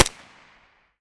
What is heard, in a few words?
combine smg head; weapons; Half Life Alyx